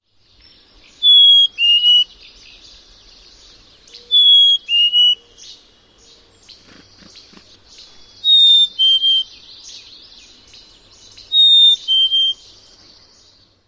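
Black-capped Chickadee song with switch in song types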